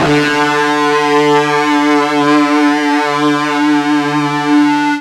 FUZZ D 2, ULTRA FUZZ, Guitar; East West; Steve Stevens Guitar Samples Collection

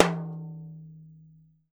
TAMBORIM, Brazil Drum Perc; E-MU Producer Series Vol. 7 – Old World Instruments

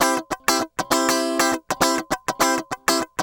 100 BPM

